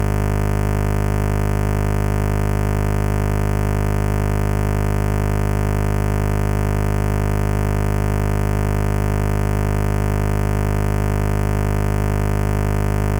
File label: Dubreq Stylophone S2 Square Subosc